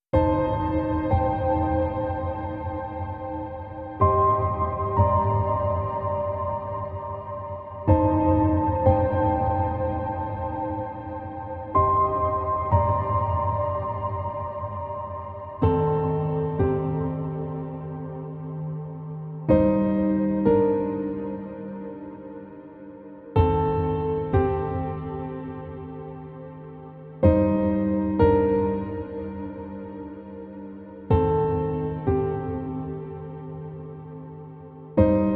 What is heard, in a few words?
Piano Mix